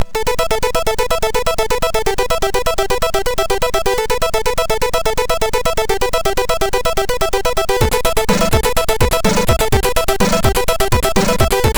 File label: Jam, MOS6581R2; hvsc